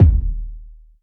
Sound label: phat tr 808 analog synth drum kick g key, Royality free tr 808 kick tuned to the G note